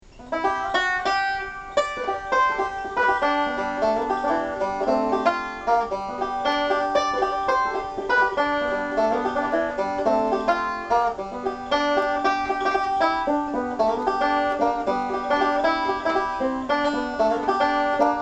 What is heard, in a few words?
banjo